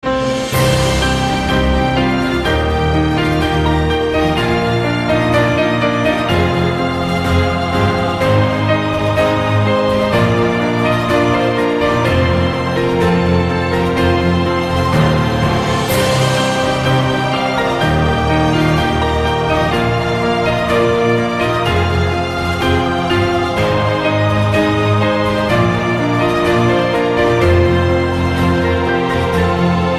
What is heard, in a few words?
the piano